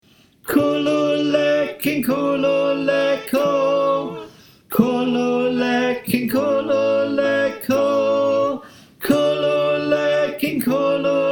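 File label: Tenor, choir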